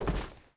body Get Up marble

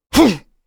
throw; UFC Tapout; XBOX

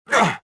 land; reborn

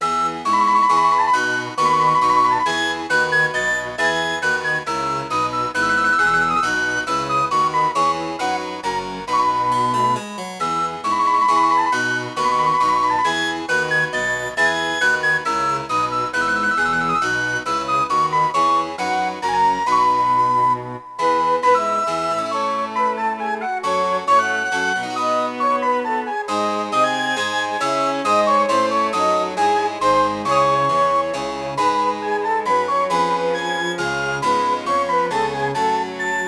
dance normal; Sid Meiers Pirates; XBOX

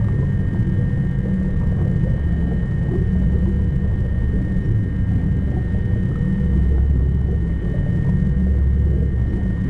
mine Pump; machines mechanical

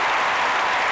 CROWD LOOP

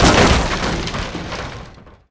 Drive In planks, Impact; Cars; XBOX

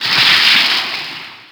knee slide, skater; Tony Hawk's Project 8; XBOX

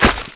Bullet Body, Impacts

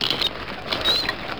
hook

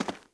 footstep walk dirt

